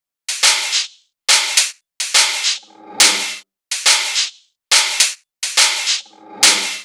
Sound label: perc; Pony Fan Music Remix Stems